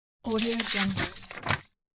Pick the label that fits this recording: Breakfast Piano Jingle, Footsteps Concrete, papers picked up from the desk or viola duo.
papers picked up from the desk